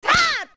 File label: Whip; Link whipping Epona